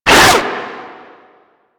Arm Attack; Enemies; Warframe; Infested; InfestedNinetyNine

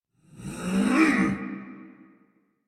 Attack Effort; Warframe; Dax Heavy; en

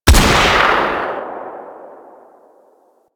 Sniper Rifle; Female

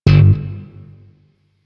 Shawzin Slap Oct One G, Chords; Music; Warframe; Sentient Shawzin

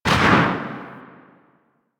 Void Dragon Orb Charge Explosion; Enemies; Abilities

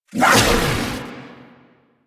Archon Whip Whoosh; Enemies; Weapons; Warframe; The New War; Nira

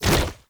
Tank Infested Arm Move Fast; Warframe; Lasrian

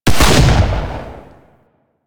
Shogun Shot C, Shotgun; Weapons; Warframe; Tenno; Alchemist Shotgun

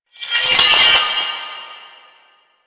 Citrine Empowered Geode Loop Start B; Warframe

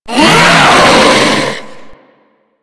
Hammer Boss Angry, Vocals; Warframe

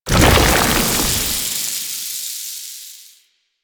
Octopede Horrors Arm Collapse; Warframe; Man In The Wall

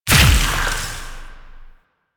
Incarnon Impact Large; Weapons; Warframe; Duviri; Incarnon Adapters

